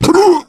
male boomer pain short; voice; Left 4 Dead 2

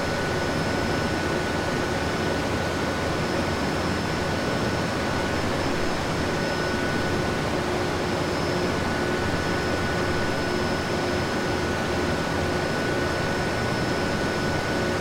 vents; ambience; AssaultCube

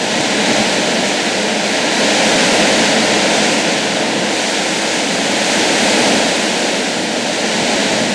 wind rain loop, rainscapes; ambient; ambience; Left 4 Dead 2

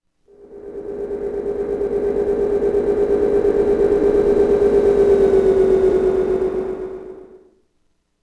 City Flyby Small; Ambient; Sid Meier's Civilization 4 Complete; Beyond the Sword

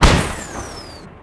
Rocket Shoot; Sid Meier's Civilization 4 Complete; Warlords; Units

